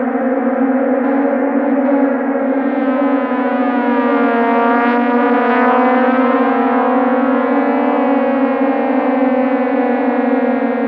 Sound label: SIRENS, ODD ATMOS; Zero-G Ambient 1